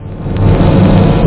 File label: bunker, whoosh